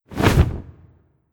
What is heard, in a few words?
Druidesspassivecloth